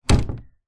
household door internal wooden modern close close up